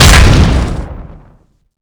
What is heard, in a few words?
hit common heavy blunt